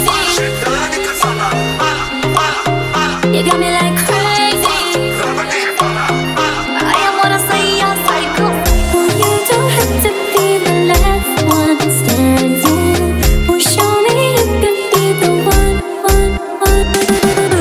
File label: remix song ringtone